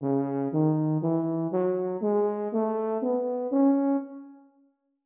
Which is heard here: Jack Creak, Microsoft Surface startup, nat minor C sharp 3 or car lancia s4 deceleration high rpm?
nat minor C sharp 3